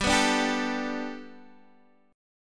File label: G Major Add 9th Guitar DADGAD, chord